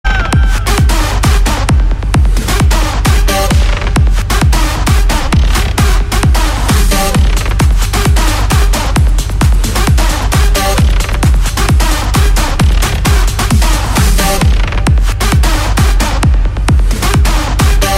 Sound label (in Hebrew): רינגטון